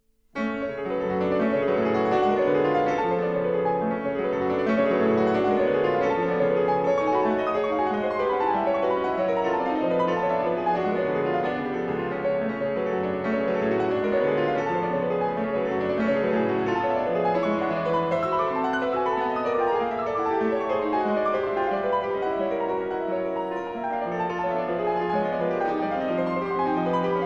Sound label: Molto Agitato, Chopin - Solo - Preludes; N8 En Fa Diese Mineur; Piano